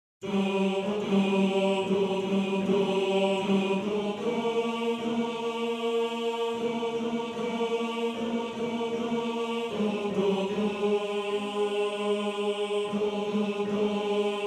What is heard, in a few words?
Bass 1, maennerchor